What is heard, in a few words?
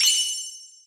Team Skill Ball Flash